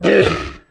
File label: golem hurt